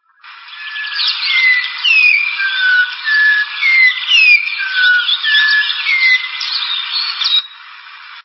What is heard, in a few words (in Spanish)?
voz matico